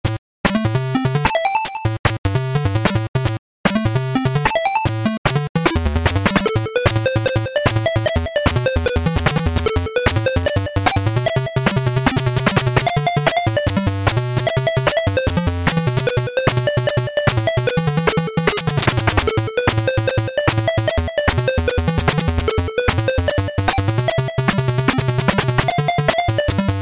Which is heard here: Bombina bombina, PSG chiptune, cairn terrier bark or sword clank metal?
PSG chiptune